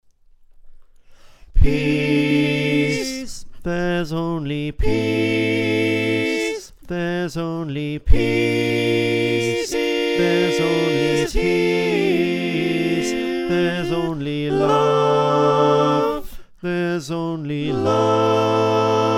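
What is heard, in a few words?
soprano alto tenor bass, choir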